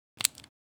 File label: switch clicks; soundscrate